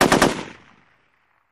Machine Gun Sngl Rap, single burst

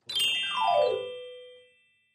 Clavichord Magical; keyboards

